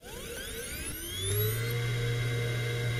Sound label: centrifuge start